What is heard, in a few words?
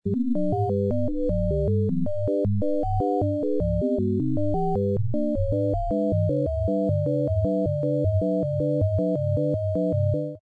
unstable oscillate